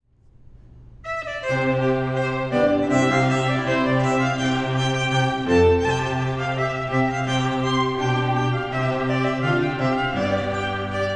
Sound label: trumpet fanfare; Belle Arte; mouret